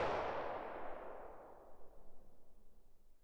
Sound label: tail pistol shot, dry; weapons; Half Life Alyx